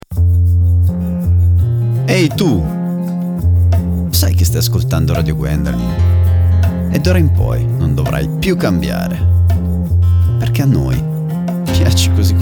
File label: Jingle